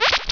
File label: GOT MINE; Escape! (2.1)